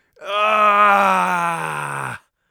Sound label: bleeding, Mage Voice Pack